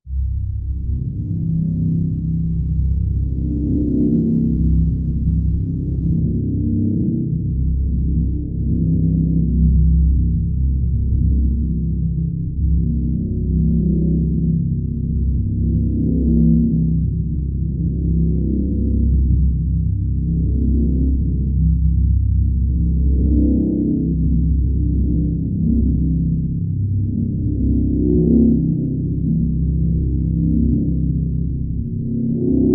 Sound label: dark drone deep waves of darkness; sonniss gdc